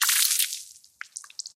Blood Gush; Friday the 13th: Killer Puzzle

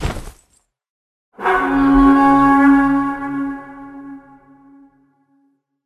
SFX Vikings Horn

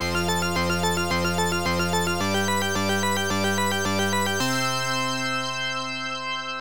Fanfare; A.B. Cop; arcade

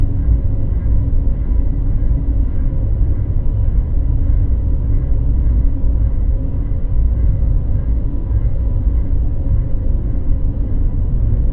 Mine ambience; game rip; Legend of Grimrock 2

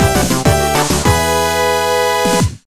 Jingle Clear; wappy dog 2011 nds gamerip

